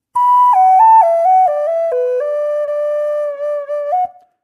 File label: b minor, flutes; hi vol